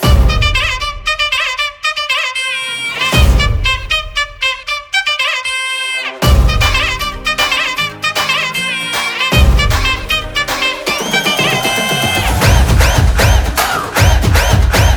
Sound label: instrumental bgm